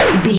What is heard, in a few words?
retro impact hit